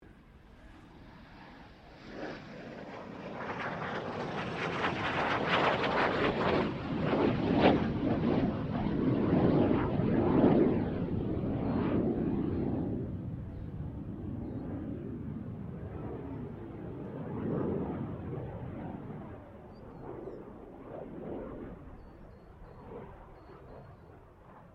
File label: AIRCRAFT JET PROVOST T2 PASS; BAC Jet Provost; Airplanes; Vehicles